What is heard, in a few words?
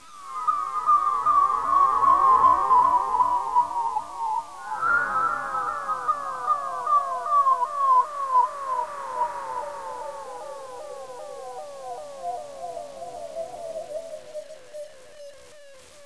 weirdo; ambience